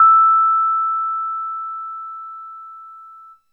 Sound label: RHODES EP2 E5; Sonic Reality - Vintage Timetraveler